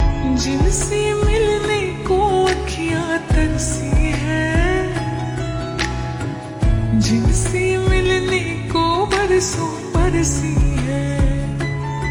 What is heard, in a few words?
Slow Reverb Version Ringtone